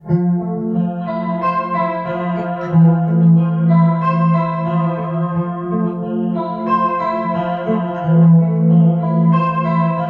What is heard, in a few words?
SPACE LULLABY